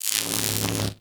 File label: Arc Rifle Fire Random; Infested